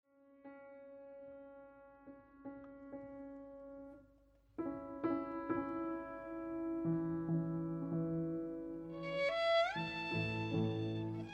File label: Gran Duo Concertant for Violin and Piano; Classica; Trio; Trio Dmitrij